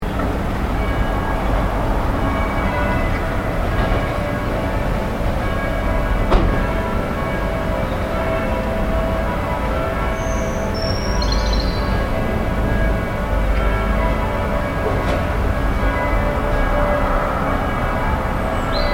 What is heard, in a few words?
worcester cathedral bells